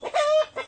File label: animal hen